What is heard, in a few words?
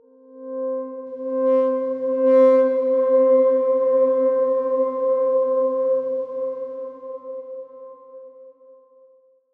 Darkswarm C4 f; HSS Ableton Pack1; healing soundscapes